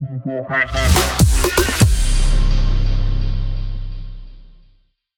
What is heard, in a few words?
Unknown Jingle; Street Fighter 6 Beta